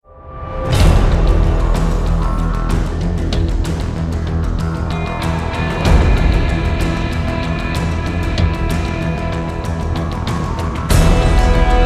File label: Menu music; Call of Duty Modern Warfare 3